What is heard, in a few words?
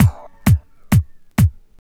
Kick, Trance, Drums